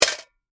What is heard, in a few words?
Coin Cup Insert, Foley, Coin, Cup, Insert, Quarter, Lid, Open; Money